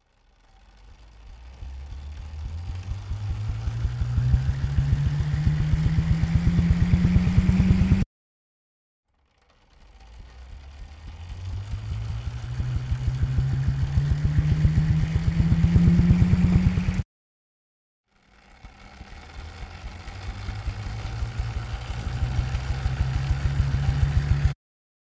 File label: Bicycle Sweep Up